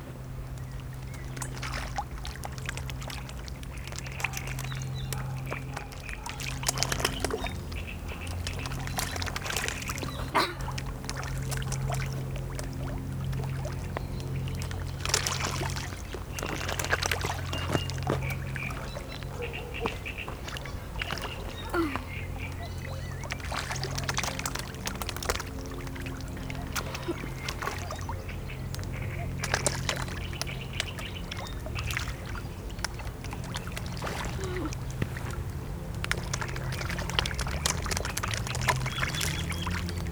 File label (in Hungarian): gyermek; halat eteto gyermek; szegedi zoo 2011; gyermeknap gyermekszaj